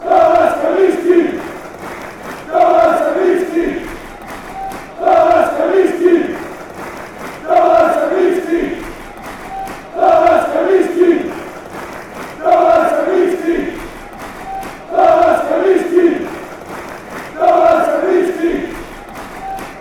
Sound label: beerschot chant, fanchants